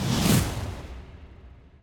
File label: Finalboss Wavespawned